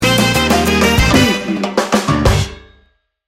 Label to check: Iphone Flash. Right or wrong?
Wrong